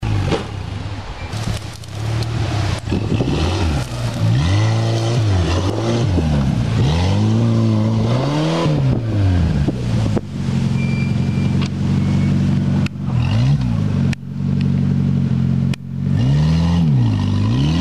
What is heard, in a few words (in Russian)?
zaezzhaet v jamu cherez travu pytaetsja vyehat i padaet na spinu